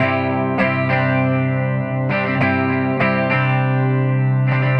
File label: Clean Chords, гитары метал